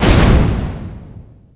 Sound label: Large Spike Pillar; New Super Mario Bros Wii